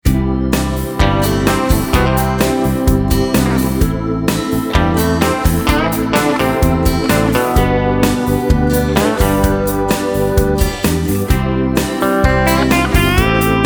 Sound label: Country